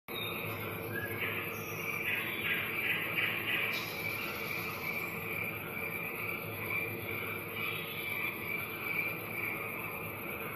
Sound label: Night Ambience; Ninja Arashi 2